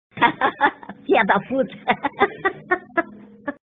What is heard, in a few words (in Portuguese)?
hahaha; fia da pura